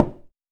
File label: High Heel